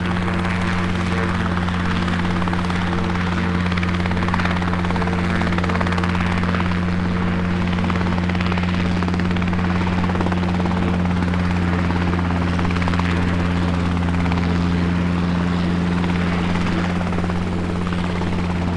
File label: transportation aircraft helicopter idle ground; sound effects one